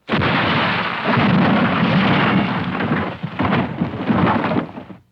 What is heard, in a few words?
Wooden Building Collapses Quickly